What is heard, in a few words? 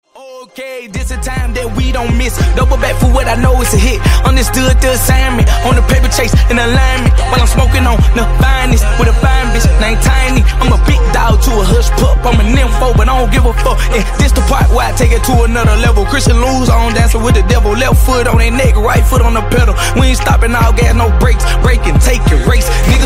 CHA CHA